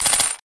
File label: Coin change